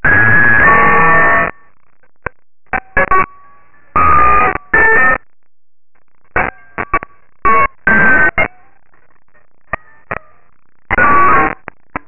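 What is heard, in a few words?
guitar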